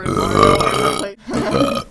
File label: my dad burping